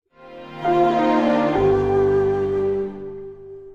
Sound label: microsoft windows me shutdown sound